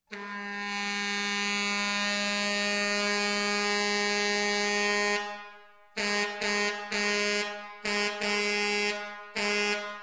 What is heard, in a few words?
Big Truck Horn